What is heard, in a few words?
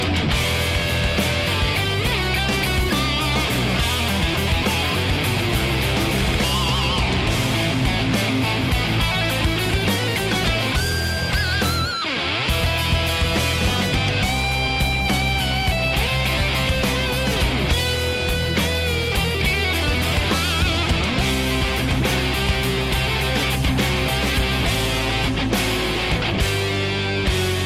Turkish solo